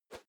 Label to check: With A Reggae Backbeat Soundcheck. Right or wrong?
Wrong